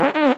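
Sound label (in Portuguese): peido, fart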